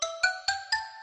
loading

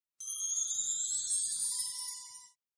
opening sound

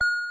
slot end

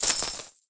music coin collision

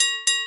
game start

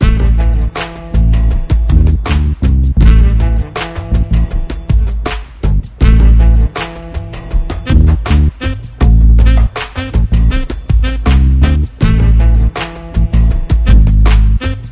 music main

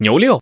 male cow

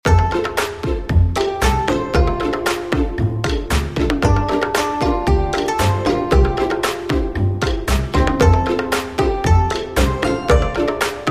game bgm

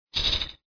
chip

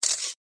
take photo

